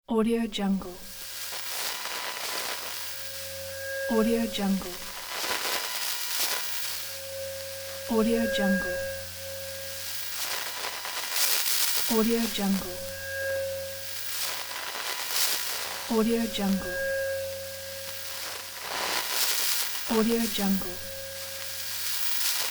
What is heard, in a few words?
radio interference